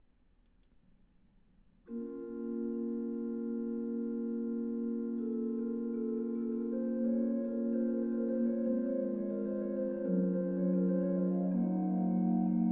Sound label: chant organ